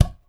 Perc Udu Mid Dry; Framedusa

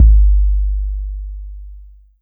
Kick Long Classic 808

